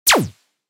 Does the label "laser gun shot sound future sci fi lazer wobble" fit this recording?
Yes